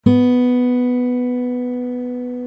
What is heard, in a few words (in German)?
gitarre h2